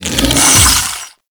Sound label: Infested Big Ashen Melee Swing; Warframe